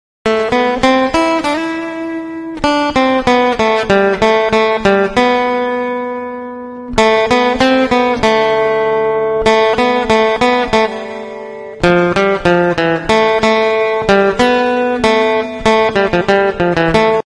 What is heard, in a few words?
guitar cover instrumental ringtone